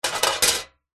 industrial tool metal spanner or similar drop on hollow metal surface; zapsplat